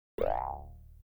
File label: electronic twangs; music electronic; Asian Show Sound Effects